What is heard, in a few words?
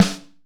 acoustic snare g key, acoustic snare tuned to the G note. Loudest frequency: 1154Hz; snare drum samples